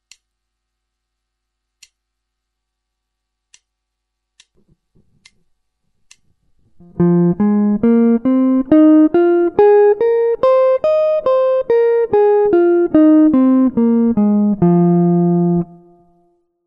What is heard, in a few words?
guitar